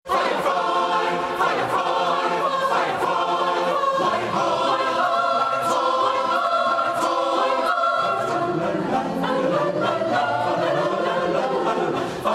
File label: Fire fire